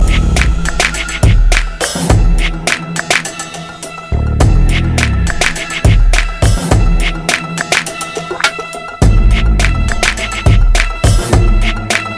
Koto

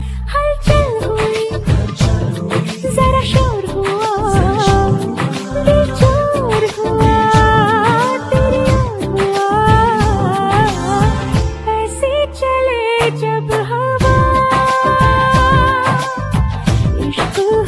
Nice Love Tone

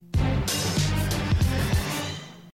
Message Tone; Gta Iv

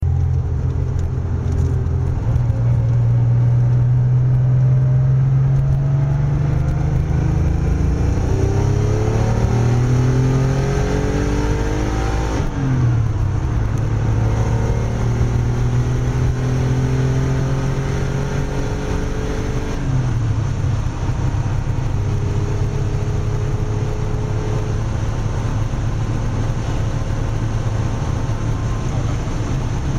Porsche Boxer 6

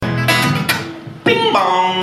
Bing Bong

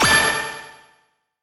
Transformation

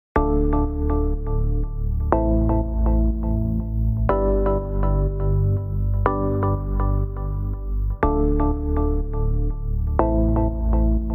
Soft Clean Ringtone

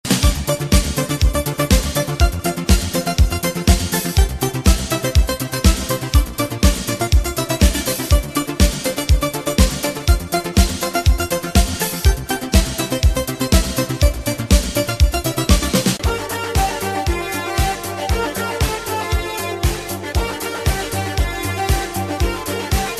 Italo Mix

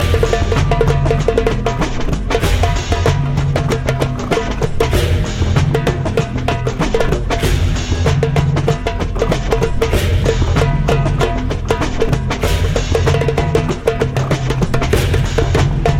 War Drums

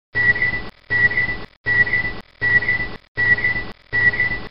Aliens Radar

